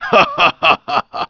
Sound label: pain; quake2; ufops